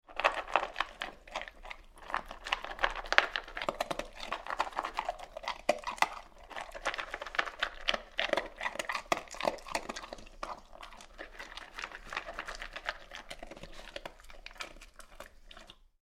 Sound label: Dog Eating Biscuits - eating dry dog food; Tom Hutchings